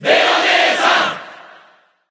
Cheer JP; Bayonetta; SSB4

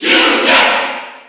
Cheer; Bowser Jr.; SSB4

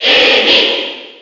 Cheer; Iggy Koopa; SSB4

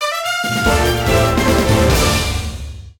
3DS Menu Jingle

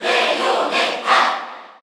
Cheer Korean; Bayonetta; SSBU

